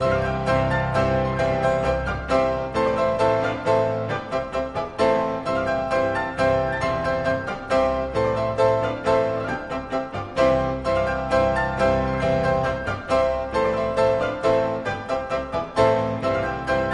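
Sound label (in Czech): Slovanský tanec C dur Presto (Furiant), varianta pro čtyřruční klavír; č.1; 1878; Antonín Dvořák; op. 46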